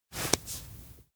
snow hit cheek; anemoi

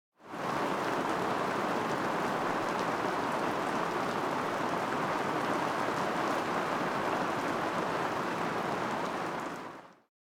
heavy rain outside; anemoi

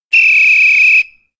whistle; anemoi

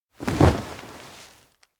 sofa lie; anemoi

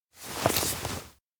diary hug; anemoi

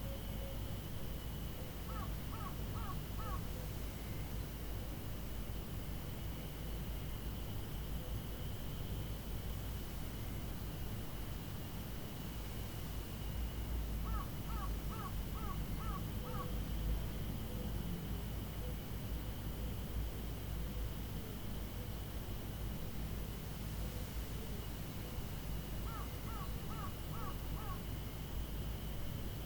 indoor play container evening; LOOP; anemoi

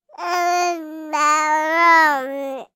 baby voice; anemoi

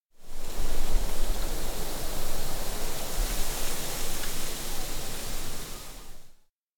wind warm; anemoi

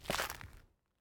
footstep back; jiri; anemoi

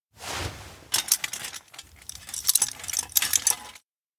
rubble dig; chiharu; anemoi

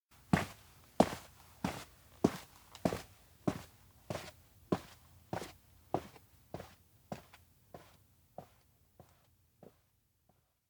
steps outdoor rebuild town walk; massan; anemoi

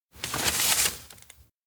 paper place desk; anemoi

